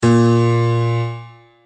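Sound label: A#3 piano; notes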